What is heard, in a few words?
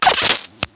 mip